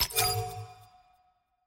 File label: toggle on click